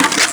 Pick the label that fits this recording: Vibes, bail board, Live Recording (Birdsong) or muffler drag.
bail board